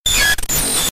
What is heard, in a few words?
Radio sound